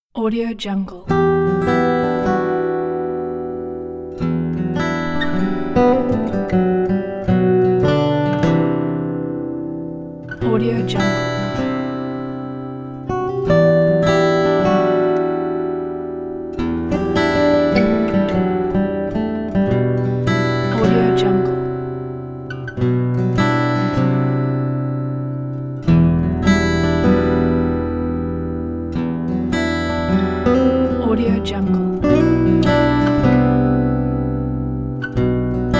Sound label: acoustic guitar nostalgia